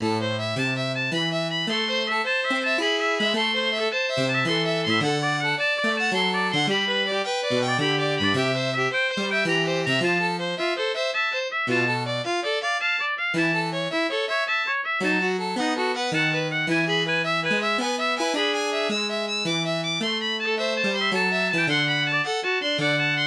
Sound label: MIDI